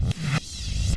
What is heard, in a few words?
scratch